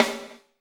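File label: snare drum, Drums; Bob Clearmountain Drums I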